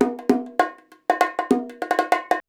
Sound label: BONG, BONGO 100, Modern Percussion Loops; Soundscan vol.56; AKAI